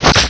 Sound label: zombie slaughter attack